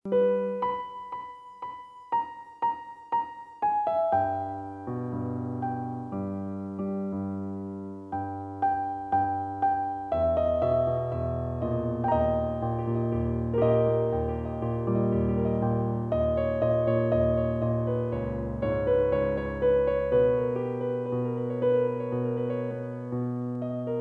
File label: Piano